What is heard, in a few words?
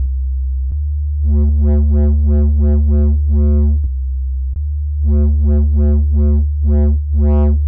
Analog Clip